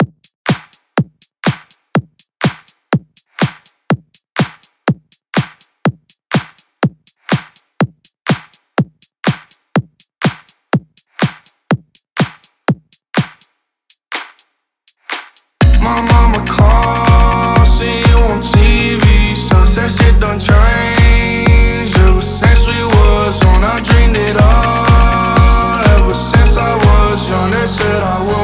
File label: House